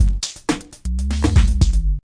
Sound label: Loops; Scratch; game maker software